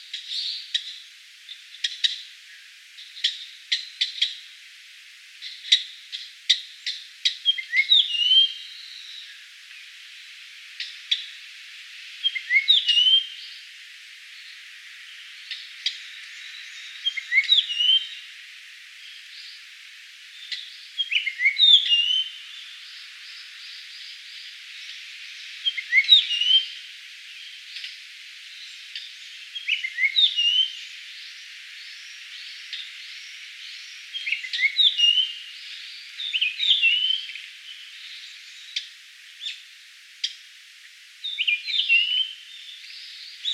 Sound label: Eophona personata, Dendrocopos major